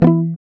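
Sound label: GUI E2, acoustic guitar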